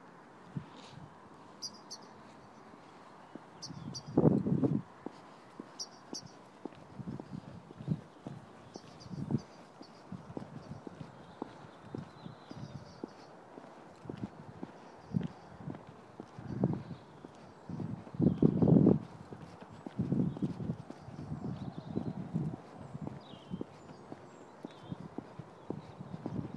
birdsong accompaniment

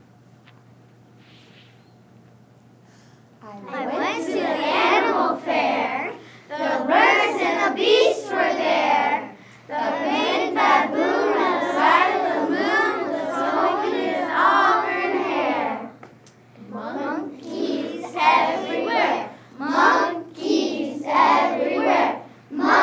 vocal ostinato

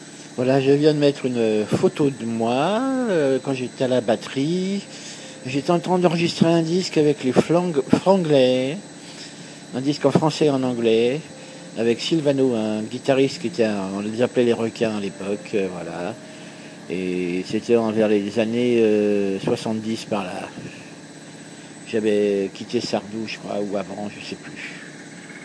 drums

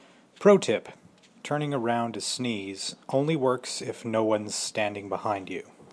sneezing

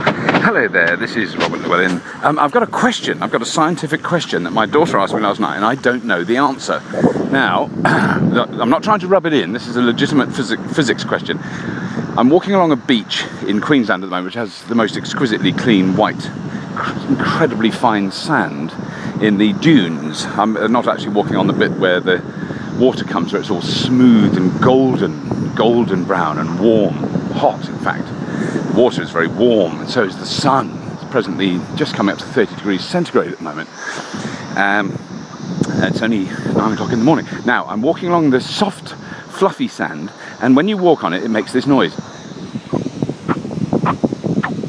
squeaky sand

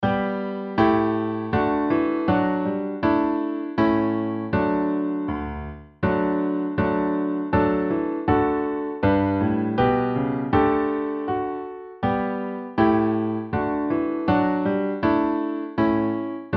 violins twinkle

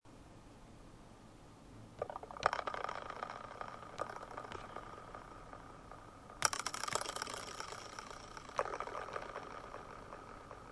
max msp improv

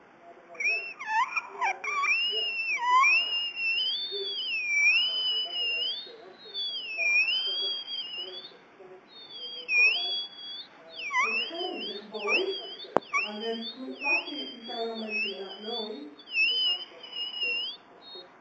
annoying whiteboard marker squeaks

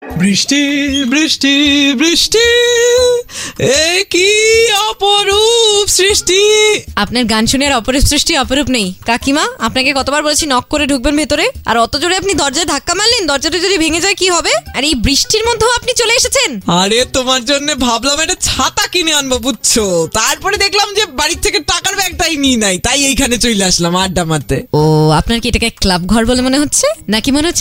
laugh

